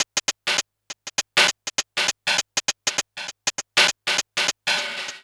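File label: MINX hi-hat; Big Fish Audio; Uncivilised G'rooves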